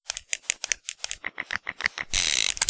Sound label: colt reload